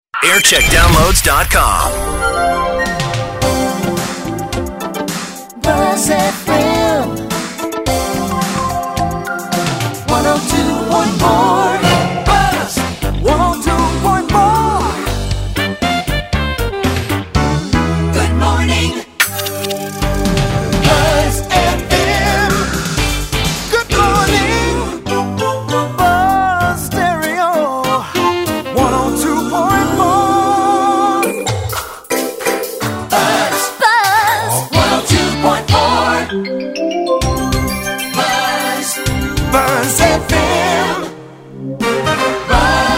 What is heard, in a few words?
jingles; buzz fm